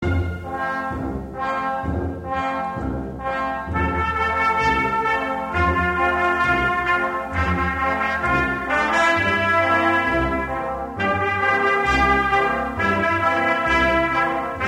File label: instrumental